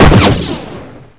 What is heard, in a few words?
firework